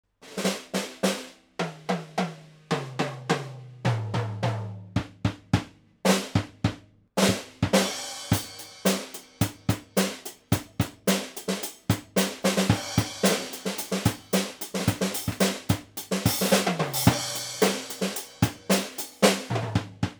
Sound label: mute, drum